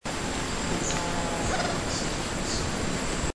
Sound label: Banded cotinga; پرنده گوهرمرغ نواردار